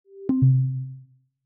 cancel; jbl; iOS 26

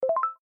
Web Page Loading In Progress; Business skieme Beta 1

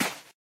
sand step; Golden Crayon Sounds

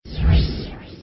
app launch; ESAN VO Sound Schemes; ESAN VO Sounds Pack; Esan isounds add-on

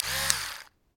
electric toothbrush; Golden Crayon Sounds

